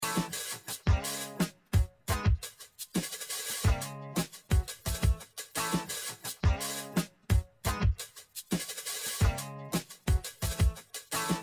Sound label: loop 70%, drums